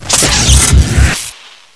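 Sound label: bfg charge, weapons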